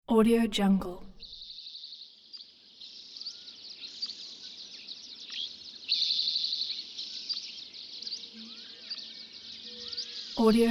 birds chirping; alexleadsounds

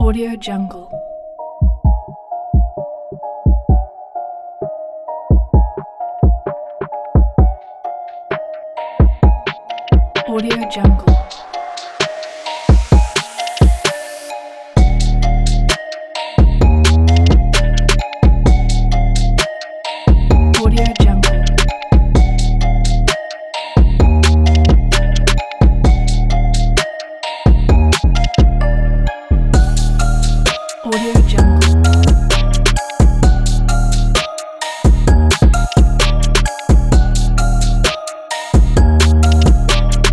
trap; irminia

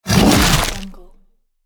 monster bite

